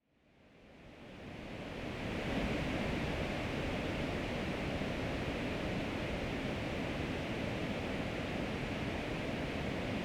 vent rose resonator

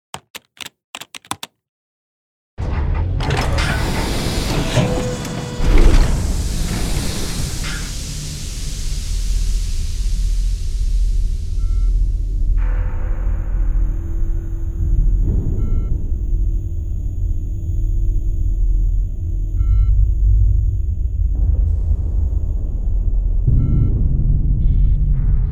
Le Vaisseau ambiance; sans pan

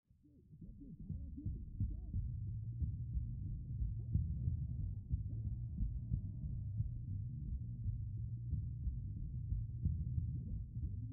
basse

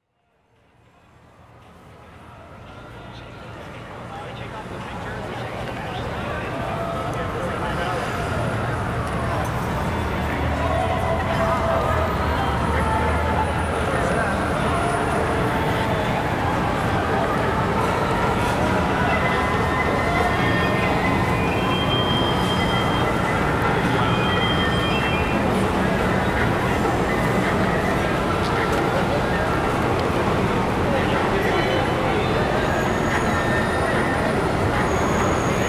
Reverbe de bus

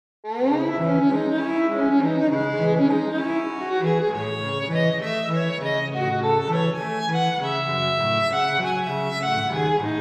Cordes Theme; ADN

